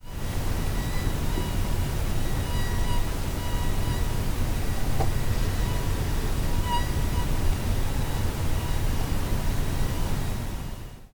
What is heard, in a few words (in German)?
mueckenton